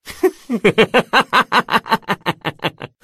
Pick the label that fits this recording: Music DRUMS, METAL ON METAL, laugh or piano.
laugh